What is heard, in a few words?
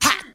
"ha", Voice